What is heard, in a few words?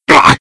Hit Or Death; VCI Blueman; Playable Tutorial Level